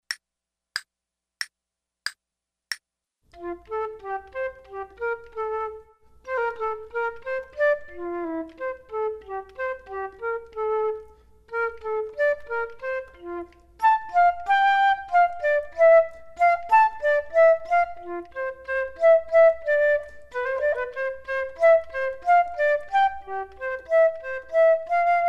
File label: Duets flute; Selected Duets for Flute Podcast